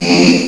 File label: z saber; MMX4SFX